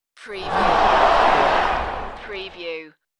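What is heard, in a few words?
Crowd Yell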